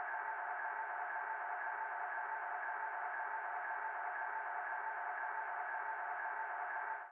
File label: autoplay Spin; flowers